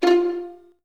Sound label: 24 PIZZ ST F1, Virtuoso Strings; ILIO; Kirk Hunter Virtuoso Strings VOL-1